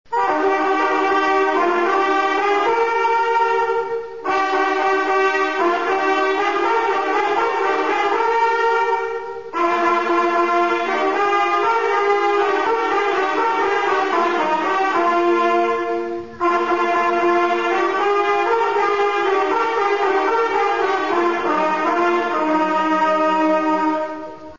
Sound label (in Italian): halali